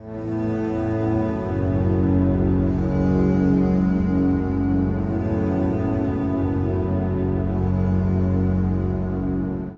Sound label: Ground Loop, Acoustic Drone; Sonic Foundry (Sony Creative Software) - Friendly Fire; DroneLoop